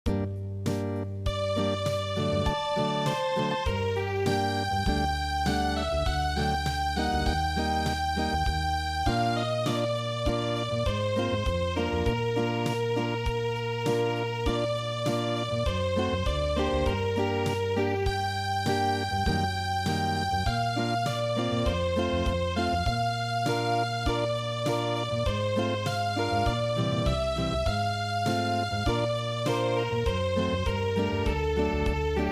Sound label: Acoustic